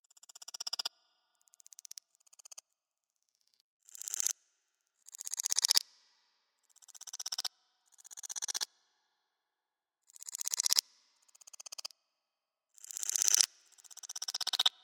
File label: Generic insect chattering sound; Chattering Insects; Insects (Sound Effects:Animals)